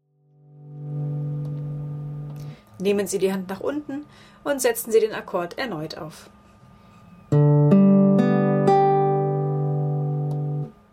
der vierte akkord g dur

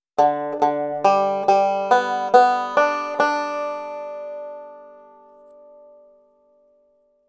alternate picking ab und aufschlaege

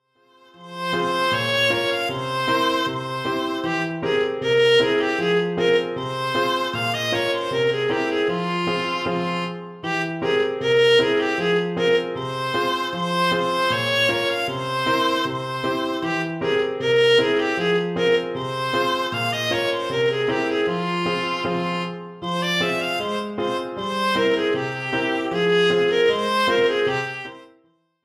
gavotte f moll vla kl

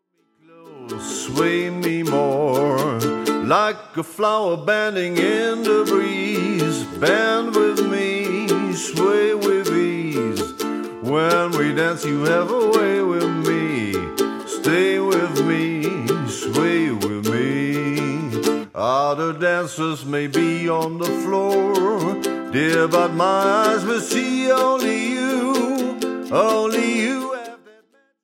ukulele gesang

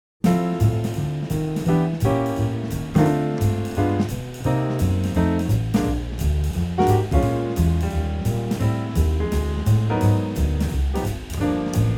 blues in f dur

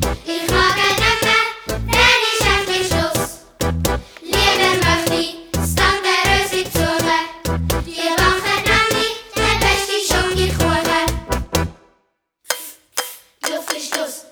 tango

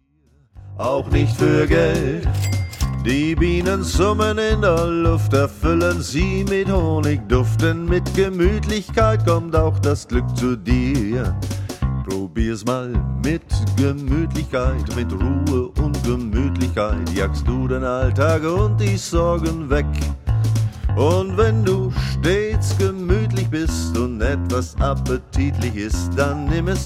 ohne ukulele

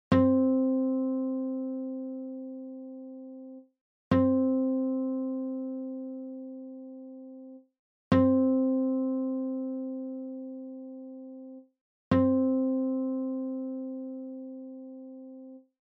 stimmton c

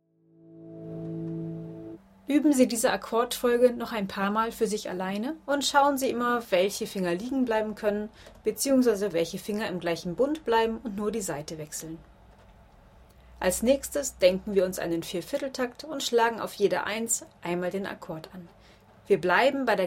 akkordwechsel D G Em A7

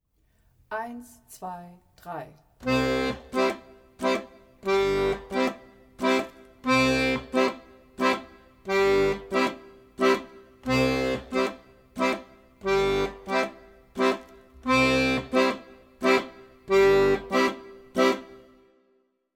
wechselbassuebungen mit dem dur akkord

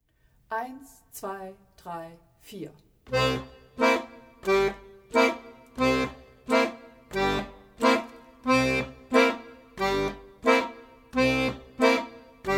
wechselbassuebungen mit dem septim akkord

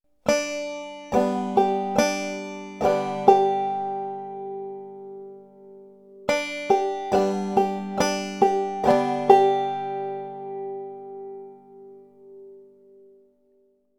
double thumbing mit bum ditty

